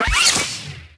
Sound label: saber hit body; impacts explos